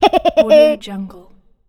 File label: cartoon laugh